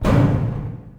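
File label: school locker; Tony Hawk's Project 8; XBOX; shops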